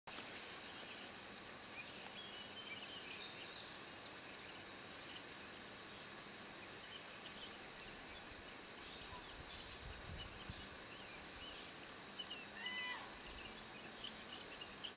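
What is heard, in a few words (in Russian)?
звонкий рёв серого красавца, крики журавлей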